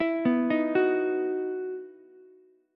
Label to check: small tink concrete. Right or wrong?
Wrong